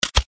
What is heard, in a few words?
drinks can opening